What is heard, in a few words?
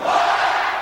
stone cold crowd what